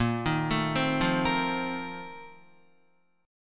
Bb Major 7th Suspended 2nd Suspended 4th Bb down Guitar, BbM7sus2sus4 chord; BbM7sus2sus4 arpeggiated; JGuitar